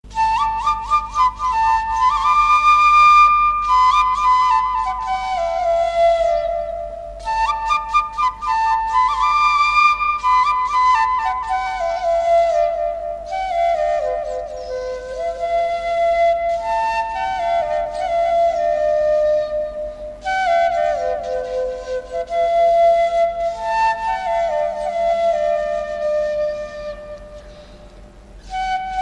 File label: balochi music